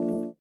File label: VIBRA; Spectrasonics; Metamorphosis